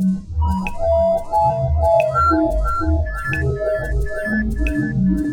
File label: tingle, soundtrack; 2kki